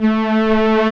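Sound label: SYN STEREO, dance synth; Sound & Vision - Gigapack I CD 2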